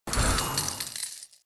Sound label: slots win